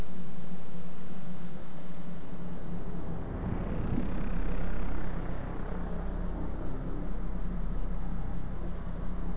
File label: weird